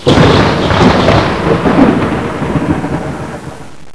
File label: thunder